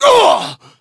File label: pain